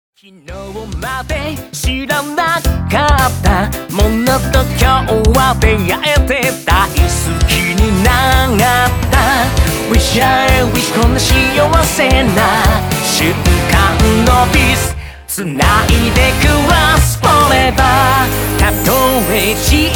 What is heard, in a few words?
Ding Dong Ding Dang